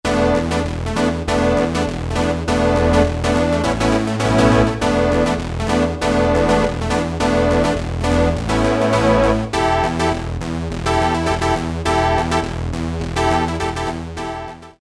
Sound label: Groov, Yamaha TG33